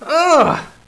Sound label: "awww", hostage reactions